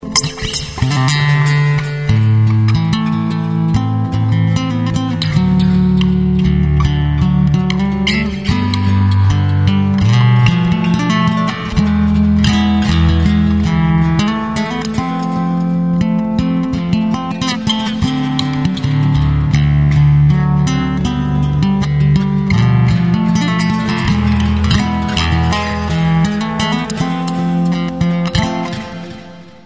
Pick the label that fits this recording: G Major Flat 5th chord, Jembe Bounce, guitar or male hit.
guitar